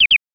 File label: double Beep